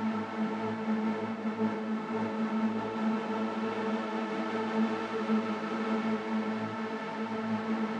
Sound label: Drone; stereo toolkit samples; Non Tempo Loops